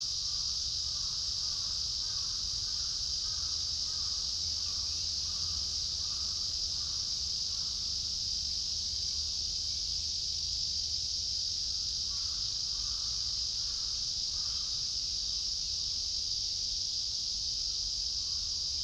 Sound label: yamanakako sound